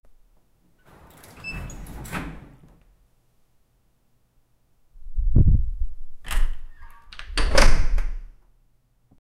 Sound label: the sound of a thin